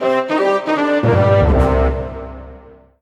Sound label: mission lost; Panda